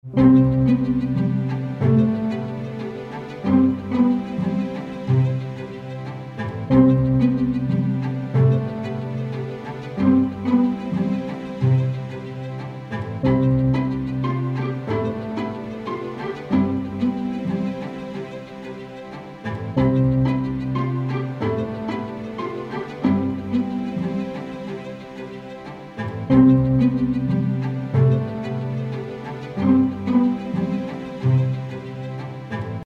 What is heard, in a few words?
PIZZ